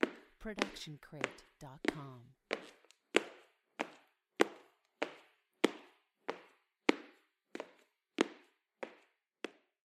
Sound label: walking indoors; soundscrate